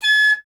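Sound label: flute piccolo G#; Miroslav Vitous - Solo Instruments VOL-2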